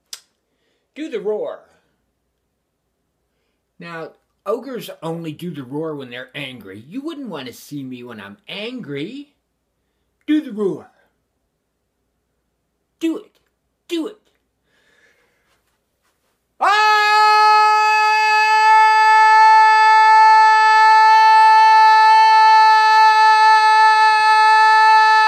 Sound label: Roar